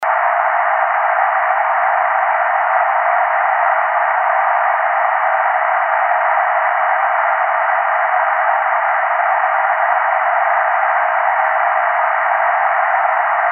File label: Electronic Static